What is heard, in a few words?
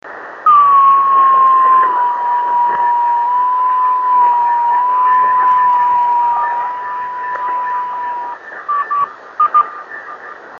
28276KHz, beacons; driftnet beacons